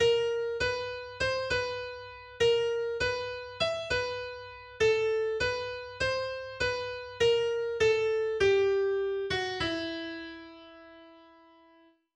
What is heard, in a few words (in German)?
Vorübung, der Ton a# bzw. bb auf der G-Saite, Gitarre; a#/bb4; Gitarrenkurs zum Erlernen des Notenspiels auf den untersten vier Bünden